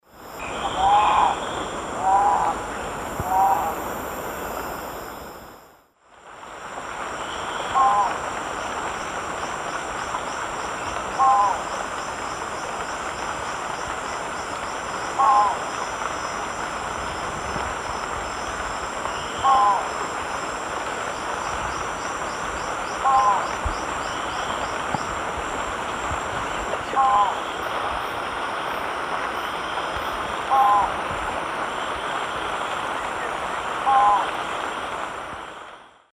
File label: Electron platyrhynchum, calls and song; S. America; Eastern Lowlands